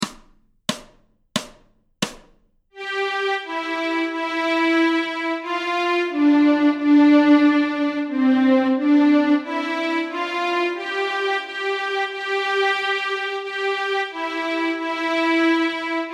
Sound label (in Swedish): Stråk C, Nyckelharpa; Majvisa; A-strängen Steg 1 Två toner i dur